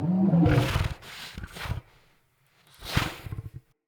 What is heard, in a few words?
Wolf Idle Trapped Groan, Animals Wolf Vocals